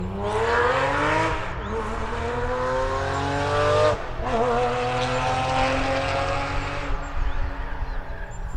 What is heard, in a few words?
Porsche 911 Gt3 Cup Car Leaving Pitstop